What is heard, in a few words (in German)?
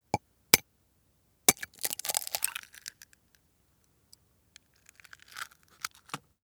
Ei aufschlagen